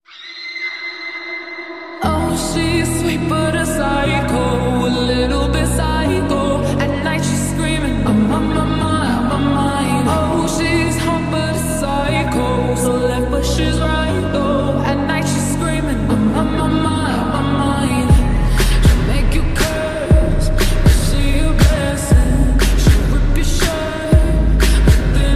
slowed reverb

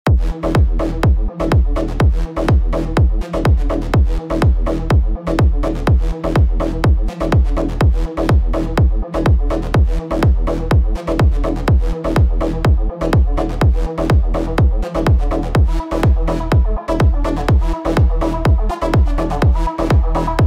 vocal tech

